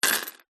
household jewelry necklace silver charms set down wood floor; zapsplat